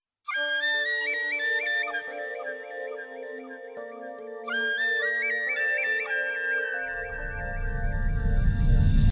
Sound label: flute tone, ringtone